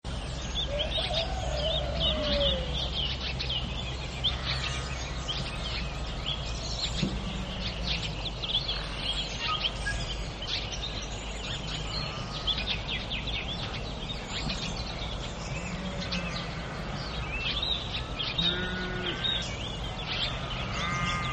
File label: Farm Early Morning; Farm Sound Effects